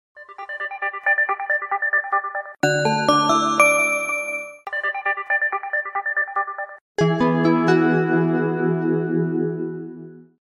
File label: soft sms, galaxy s4, ringtones